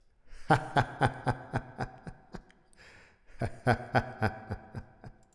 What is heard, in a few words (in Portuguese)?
risada